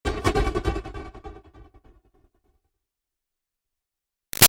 Impact Phasey Delay Chop; Stab Sound Effects; Sound Design; BigRoomSound

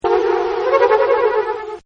FLUTE, Woodwinds; Sound Effects:Musical

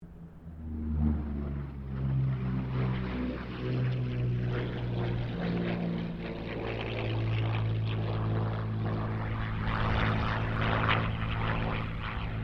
AIRCRAFT P-40 KITTYHAWK PASS DISTANT; P-40 Kittyhawk / Warhawk / Tomahawk; Airplanes; Vehicles

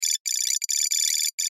Sci Fi Data Read High; Control Panel; Blastwave

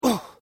Pain Umph, Male Painful Grunt, Hurt; Grunt Sound Effects; LYN116 package of human sound effects

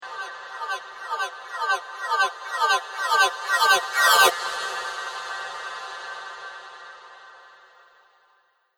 Arcade Game Incoming Laser Pulse; Video Game Sound Effects; Retro Inspired; Think Nintendo, Atari, C64; Andrew Fleming

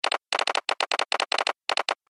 Telegraph Sounder, beep; Telegram; Beep Sound Effects

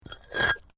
PAVING STONE FALL; Material components for constructing more complex sounds; Drop Sound Effects; Drops (Sound Effects:Objects)

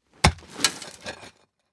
Pick Axe Into Dirt Dig Pickaxe; Yard Tools; INDUSTRY; Blastwave

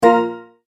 multimedia tone exclamation; Tones; Sound Design; McKinney Sound

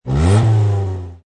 Car Motor Engine Rev Engine Perspective; Vehicles; Lynne Music

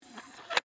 Metal Hinge Open, Foley, Metal, Hinge, Open; Latches; Objects; Blast Wave

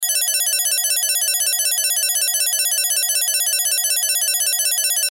Casino Slot Machine Bell Alarm Win Jackpot Loop

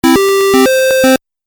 Game Music Phrase, Video Game Sound Effects

